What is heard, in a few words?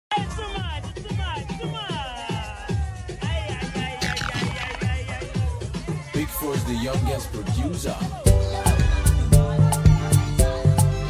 Amapiano Instrumental